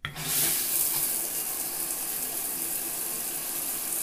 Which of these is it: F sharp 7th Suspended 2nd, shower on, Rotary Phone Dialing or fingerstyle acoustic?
shower on